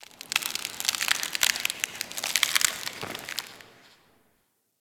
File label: Ice Creak Echo; Ambience; Warframe